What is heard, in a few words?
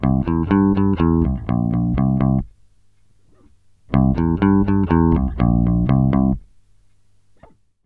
NUTS 122 C, Bass Grooves; USB Soundscan vol.30; AKAI